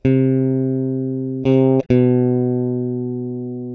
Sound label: Elect Guitar